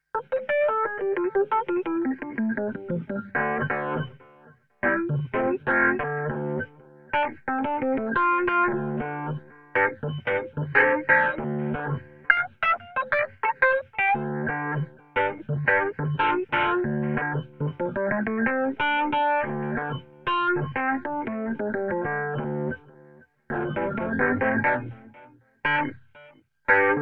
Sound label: Rhodes